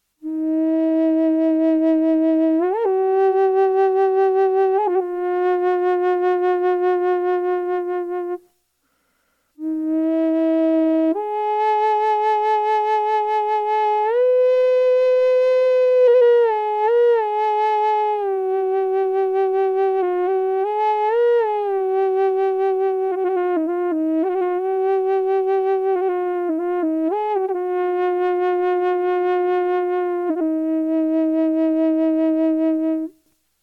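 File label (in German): Aeolian E ohne Effekte, Native American Drone Flutes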